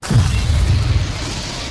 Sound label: ice hit; DAoC